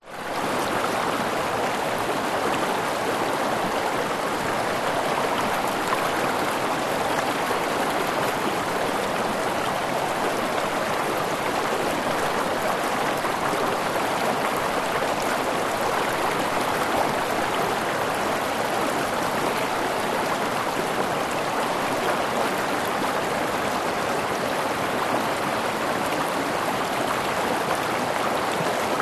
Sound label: nature river fast flowing shallow close up; zapsplat